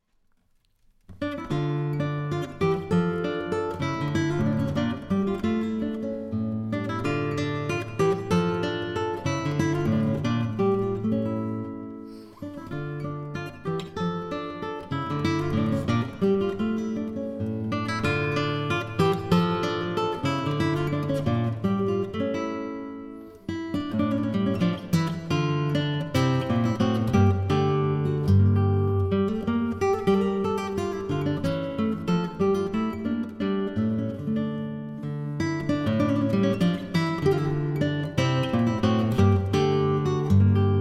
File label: solo gtr mix; De Visee